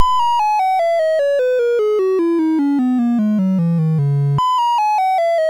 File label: sid MOS6581R4; Bear George